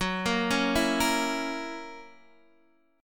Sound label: Gb 7th Gb down Guitar Open D, chord